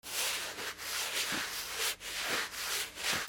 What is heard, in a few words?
FRICTION